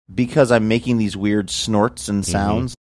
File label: weird snorts and sounds